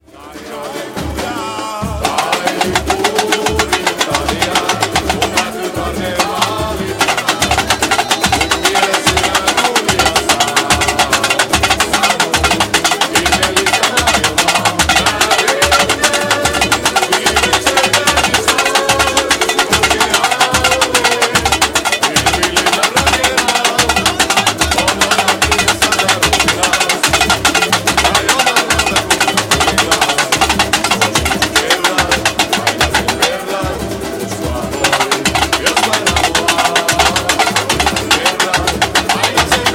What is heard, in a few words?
tamborim